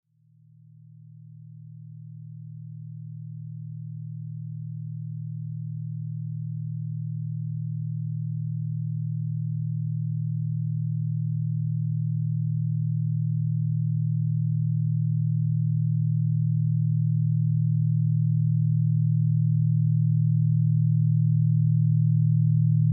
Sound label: gamma, binaural beats